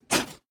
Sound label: spitter; factorio; Factorio 0.14.21; creatures